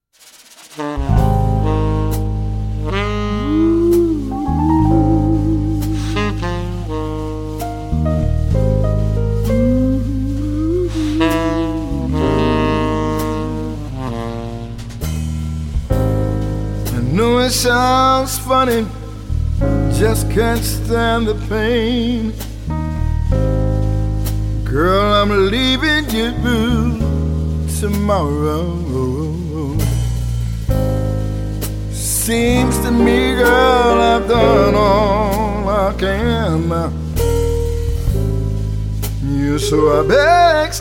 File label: Guitar Jazz Trio, Male Voice